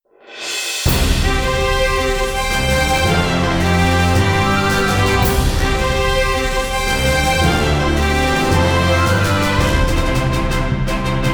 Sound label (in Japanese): 祝福のファンファーレ; Phantom of the Kill; Kill Himetachi no Omoidehen